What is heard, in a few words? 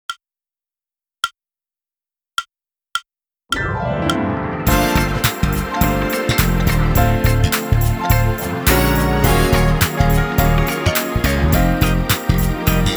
Gospel Jam drumless